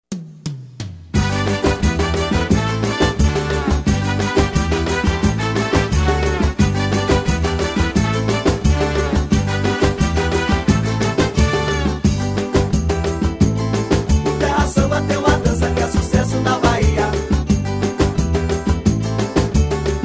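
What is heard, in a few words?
backing vocals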